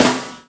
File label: SD HOU, Dance Mega Drums; Best Service